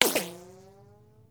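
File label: bullet double ricochet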